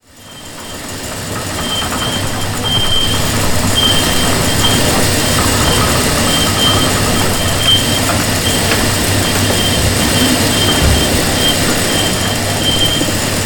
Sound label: Sewing machine